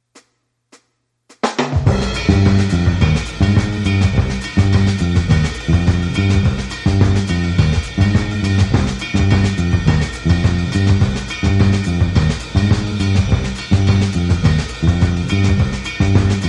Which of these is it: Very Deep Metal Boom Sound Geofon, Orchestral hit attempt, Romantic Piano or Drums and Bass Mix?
Drums and Bass Mix